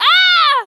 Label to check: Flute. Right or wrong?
Wrong